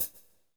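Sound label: hi-hat, drums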